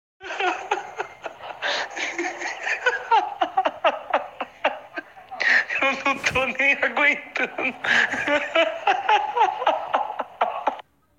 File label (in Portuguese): risada; mario